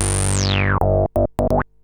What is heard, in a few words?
Analoop; Sony; ACID Pro 7.0